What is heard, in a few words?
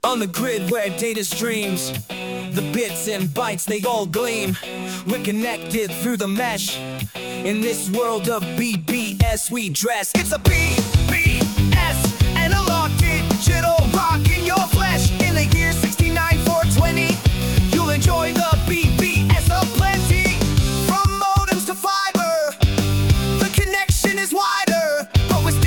Dopersynth; Digital